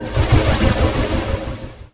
psionic wait; Earth and Beyond; EA Games

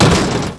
heavy hover collide; Army Men TiS

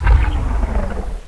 zoomout

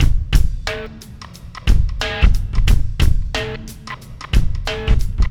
Drums, Rock; Loops; ACID MUSIC

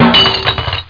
Ball Hit; Total Distortion Big Demo

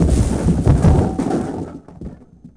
Vehicle Explosion; shadez

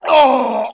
player pain; Daikatana

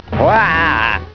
blaaagh

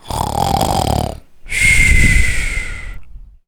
snore; DirectX SDK

